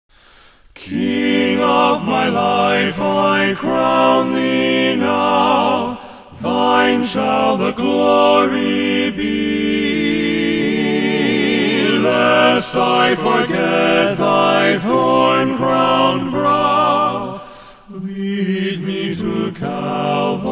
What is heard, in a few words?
men's chorus